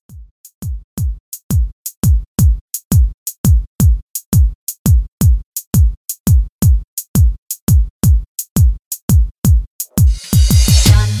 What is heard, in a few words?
In Outro 85Bpm Clean